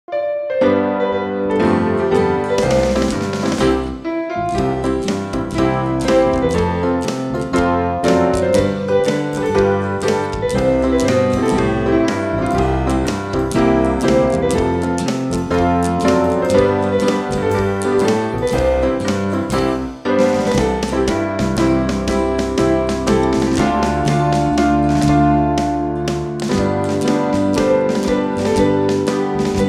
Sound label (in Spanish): Pista Coro Lema Jovenes Soldados